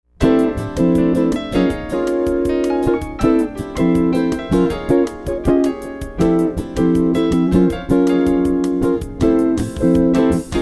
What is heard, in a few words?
instr with guitar